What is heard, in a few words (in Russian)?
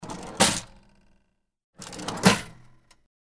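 cash register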